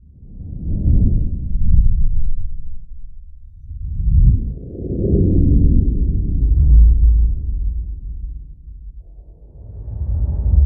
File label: medium low whooshes; cinematic whooshes